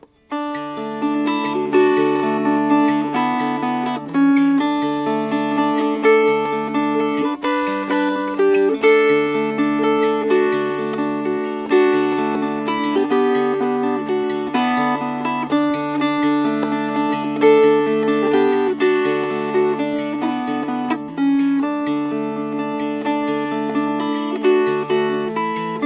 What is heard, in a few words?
Sir Donkey Fingerpicked